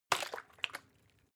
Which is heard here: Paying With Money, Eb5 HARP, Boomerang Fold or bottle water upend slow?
bottle water upend slow